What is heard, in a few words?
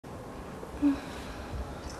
mmm